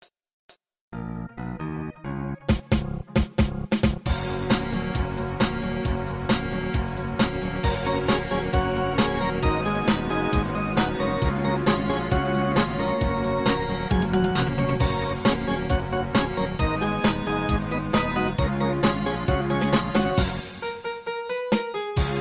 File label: High Key